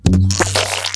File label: prize idle flower; Prizes Other